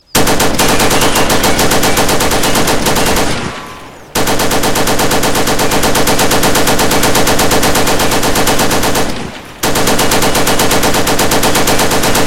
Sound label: M60 firing